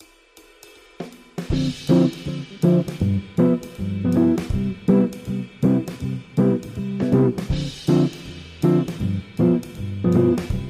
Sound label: audio jazz; ritmos de jazz